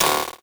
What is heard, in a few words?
snare; goodtakimu drumkit; fl studio; drumkits